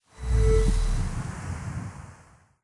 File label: Sfx Golf